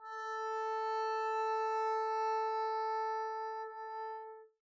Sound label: arco a4 p down, double bass